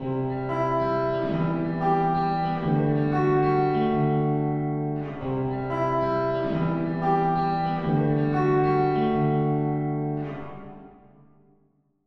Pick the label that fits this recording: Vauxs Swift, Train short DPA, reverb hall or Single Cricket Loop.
reverb hall